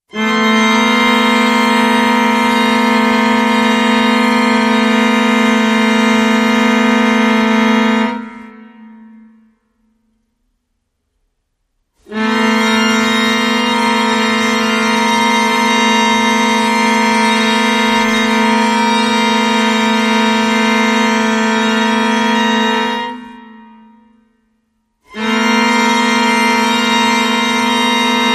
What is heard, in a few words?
Strings Only